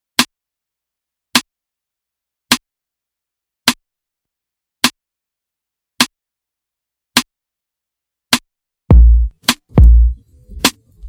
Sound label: Instrumental